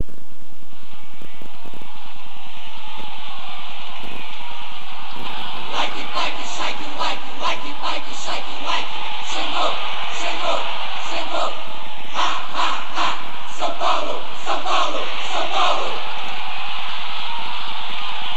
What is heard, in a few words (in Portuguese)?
gritos de guerra tradicionais; ritmo da vitoria